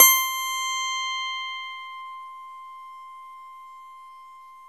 SLIPPICK; MORPHEUS

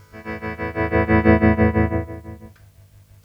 VIBRAPAD01, VIBRA PAD; USB Soundscan vol.31; Miro s Grooves; AKAI

